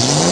7000 RPM, engine; AssaultCube; Alien Stars; American Muscle Series; SkyEngine

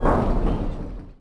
spaceship fortify; Sid Meier's Civilization 4 Complete; Beyond the Sword; Final Frontier; Units

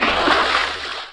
water walk; Mount&Blade Warband

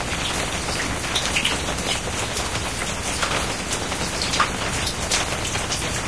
rooftop train loop, ambience

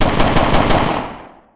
light infantry; Emperor - Battle for Dune

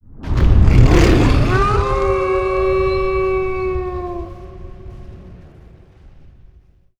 magic summon beast; Hearts of Iron IV Death or Dishonor

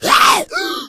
smoker attack, voice; Left 4 Dead 2

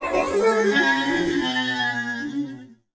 Mech Death Vox; Sid Meier's Civilization 4 Complete; Beyond the Sword; Units

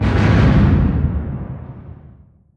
distance heavy cruiser attack; Hearts of Iron IV Death or Dishonor

